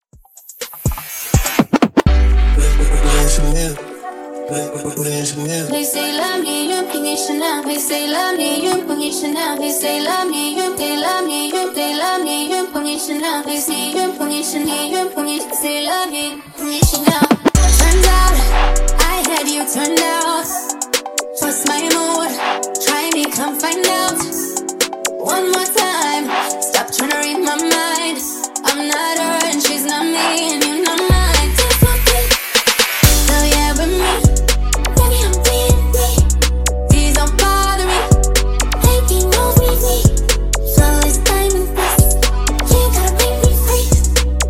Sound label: Slam Intro Miami Bass Remix 124bpm